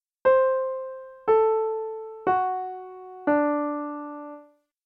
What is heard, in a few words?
Treble D7 down